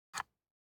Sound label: DICE on Wooden Tray, Grab, Standard, 2 Two Dice; Samples; ArticulatedSounds; DICE Sfx Party (Extended)